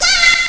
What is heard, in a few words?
"YEAAH!"; ST-XX Amiga Soundtracker Sample Packs